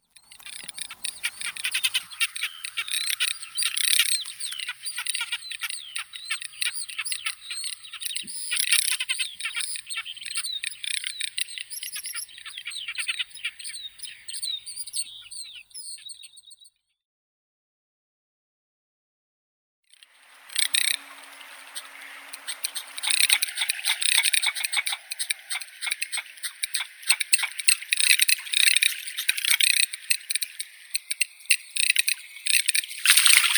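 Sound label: garganey, anas querquedula; chirok treskunok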